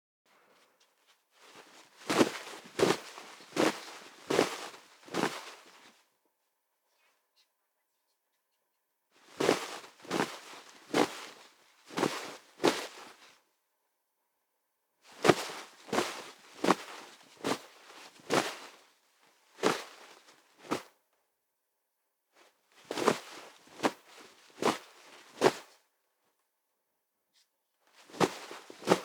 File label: Bird Fly; Prince Of Glory